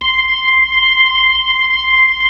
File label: B-3, Club